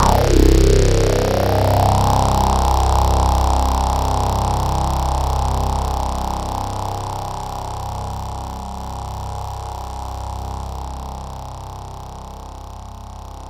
THE BUZZ, KURZWEIL K2000

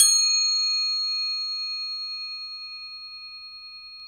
Crotales, Orchestral Perc

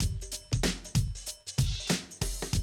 Classic DrumLoops

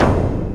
toms kit, industrial loops; USB Soundscan vol.24; AKAI

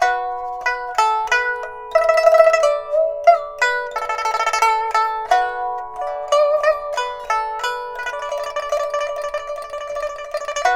PIPA, 90; INIS - Opium; PIPA 90-D

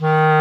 WND CLAR, Clarinet Sh; Roland LCDP04 Orchestral Winds; 90 s Sample CDs

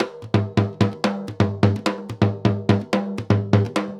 SURDO, DRUMS SAMBA; Best Service ProSamples vol.14; AKAI

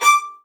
XLD1 D6, LOUD VIOLINS; Q Up Arts; Denny Jaeger Master Violin Library

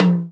Old Skool House Perc

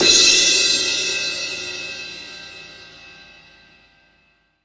CRSH, CRASHS, House Side Of 2 Step; USB Soundscan vol.51; AKAI

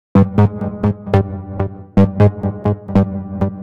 Bass, 132bpm, Nu Dance Electro; Zero-G; Soundclash; Combi Loops

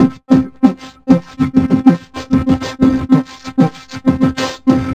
Beat Box, Vocal

